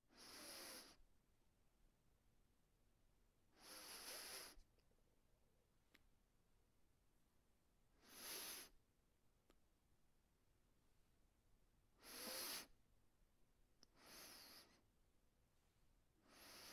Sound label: nose sniff